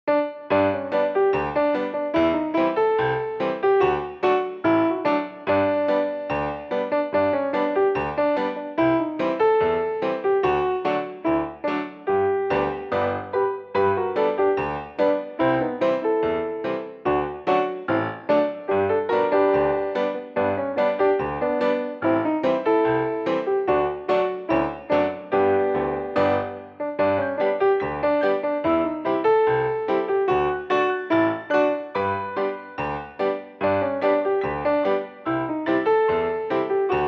Song Duck Piano Mix; baron fur is gonna fly; switch windows xbox one

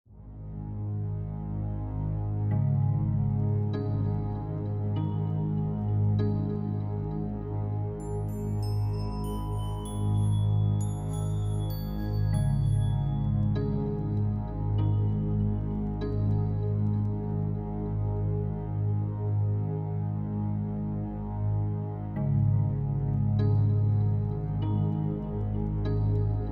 Evil Portal; bewitched; macos ps vita ps4 switch windows xbox one xbox series xs gamerip

